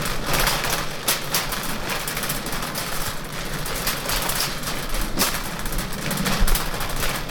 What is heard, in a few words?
Laundry Trolley Loop